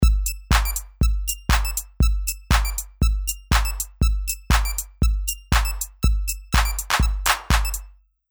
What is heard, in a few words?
Lead Tiny Rect; Ultra Analog Session